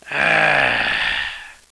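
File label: die, alien controller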